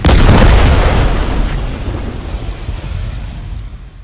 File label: Exp Tank Far; Mars Sortie